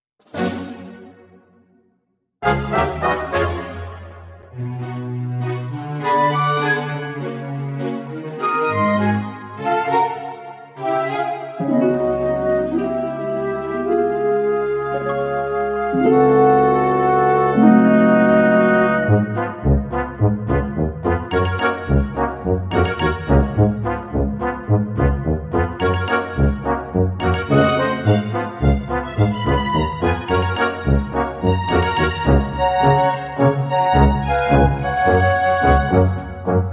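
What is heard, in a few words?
accompaniment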